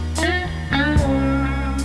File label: beeps